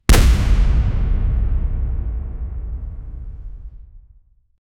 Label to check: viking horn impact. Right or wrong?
Right